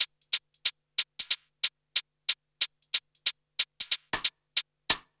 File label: HH LP, Hip-Hop & R&B; USB Soundscan vol.47; AKAI